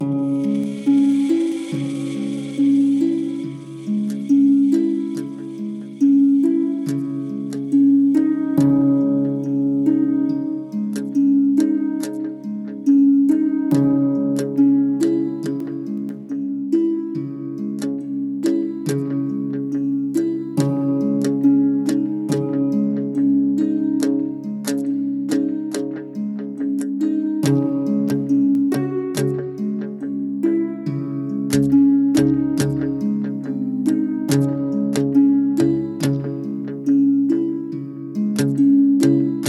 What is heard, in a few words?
Cinematic Final Stand